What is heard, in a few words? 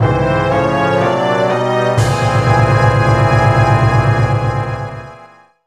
Dungeon Appear; Legend of Zelda The Majora's Mask 3D 2015 3ds